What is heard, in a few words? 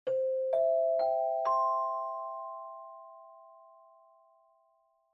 pinponpanpon